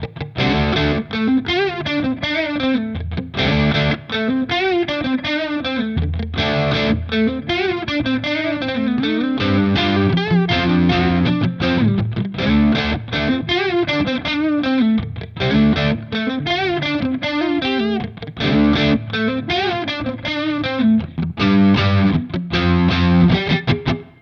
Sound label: Fender both crunch